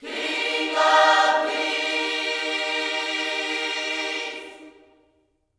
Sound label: KINGS, Gospel; Spectrasonics; Vocal Planet; HALLELUJAH